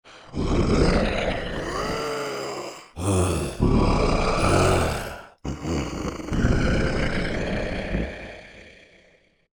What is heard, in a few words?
Afflicted Phantom Vocals